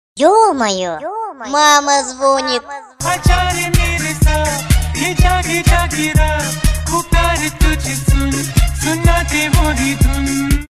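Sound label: call from mom, India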